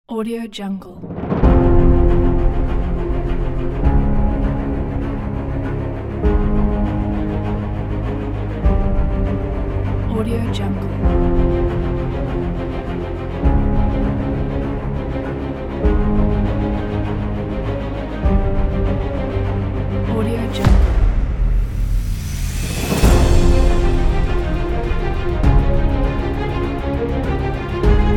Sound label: awards epic moments